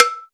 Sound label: conk, Misc Percussion Ticks